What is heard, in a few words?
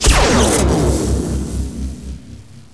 flash shoot